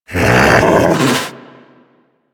Snow Critter Vocal Alerted; Warframe; Hunting Critters

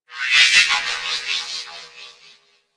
Corpus Ship Robotic Random Calls; Ambience; Warframe; Corpus Ship Remaster

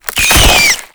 Purification Weakpoint Appear D; Infested Survival

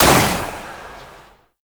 Shot, Pistol; Warframe; Weapons; Tenno; Tenno Priest Pistol; Knell Prime

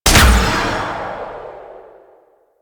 Archer Rain Of Arrows Fire B; Warframe; Duviri; Duviri Dax; Abilities

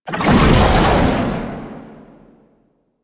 Perfect Heavy Attack Activate; Warframe; Weapons; Melee

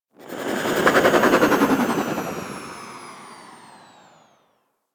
Archwing Movement Slow Wind Down; Warframe

